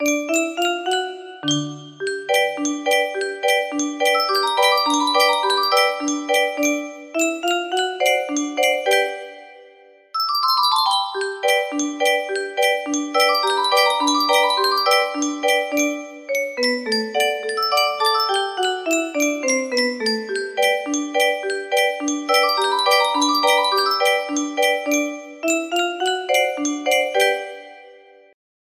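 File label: music box melody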